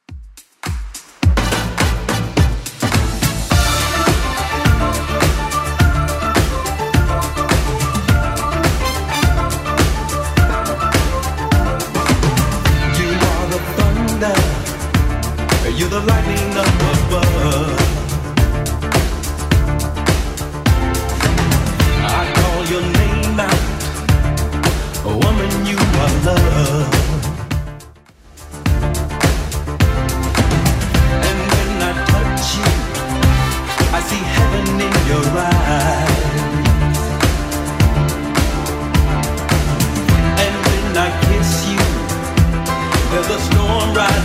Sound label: 80s Club 105 bpm CLEAN